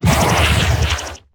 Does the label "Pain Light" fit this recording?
Yes